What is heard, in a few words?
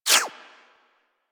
Laser Whizzby; Warframe; Weapons